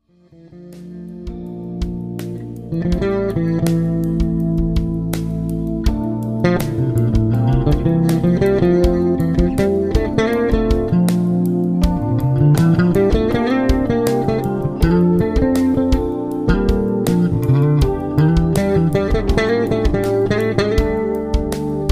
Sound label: 16th feel with brushes